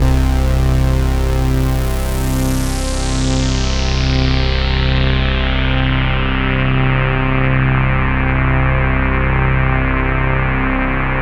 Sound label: PAD C2, Roland MKS-80 synth pad; Pads